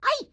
grandma short yell; Blind Drive

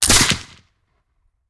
Musket; Sound Ideas; Guns

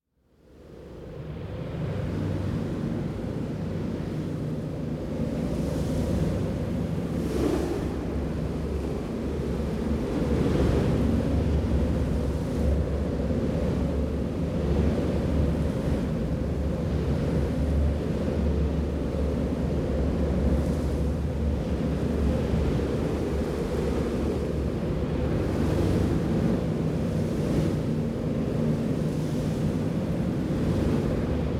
Wind Strong Ambience, Some Wind Rumble; Hollywood Edge; sound designer's toolkit v2

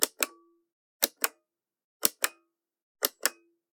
Generic Button Metal Small; boom library; The Interface

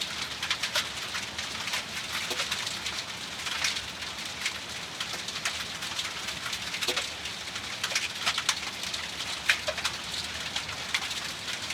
skid little stones; Blind Drive

